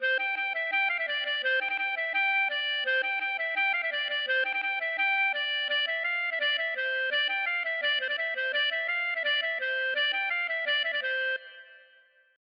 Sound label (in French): maraichine